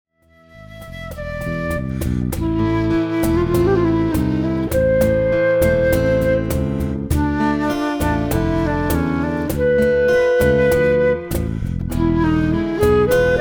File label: Flute